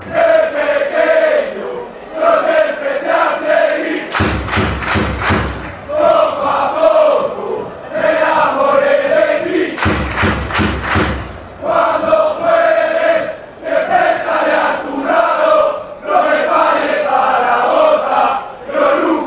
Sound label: fanchants